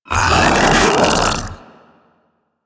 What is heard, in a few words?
Vocal Attack Medium, Infested; Enemies; Vocals; Warframe; Grey Strain; Splitter